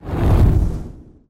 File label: transition